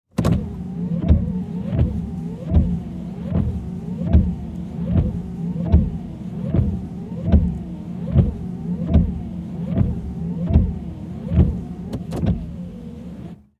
Transportation Cars Honda Civic Run Windshield Wipers